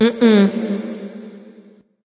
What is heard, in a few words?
disagree light; smite; voicepack; hel